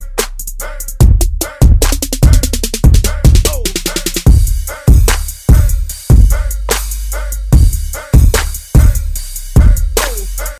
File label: 3d Hip Hop Beats